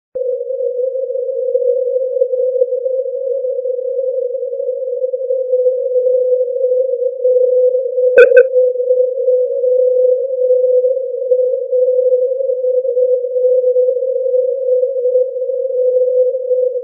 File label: cw qso